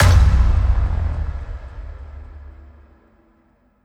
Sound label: Impact